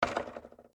hitting wall; toystory; rex

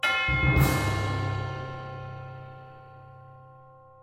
wave; Pirates; mermaids song

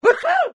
Sneeze; snow white

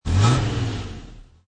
Rev Short; Cars

